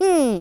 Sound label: "Hmm"; hedgewars; Default ru